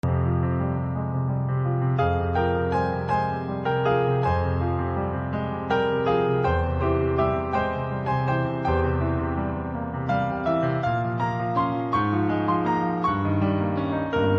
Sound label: song with piano; bow tie movie